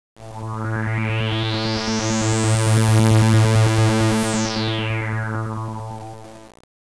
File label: SWEEP, Siel DK-600